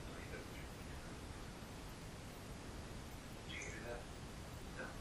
Barn EVP

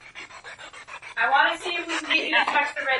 Basement Spirit Box; gill house investigation

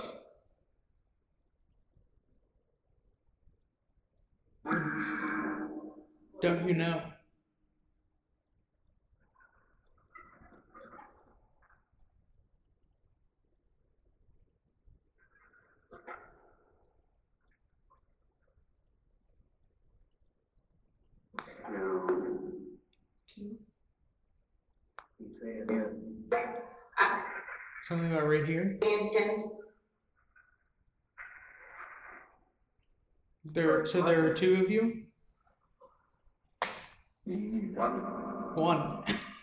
Phasma Box; Barbershop Corner

